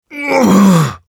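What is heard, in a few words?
pain sharp, Russian; Engineer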